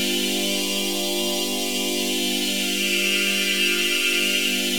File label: Pad Loop G, shimmer and sparkle